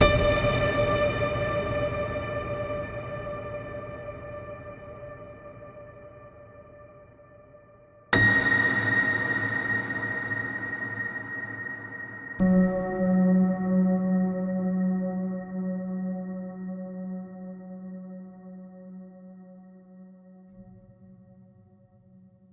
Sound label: melody a, ambient